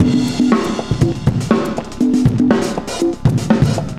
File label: cooling 120, loops, 120bpm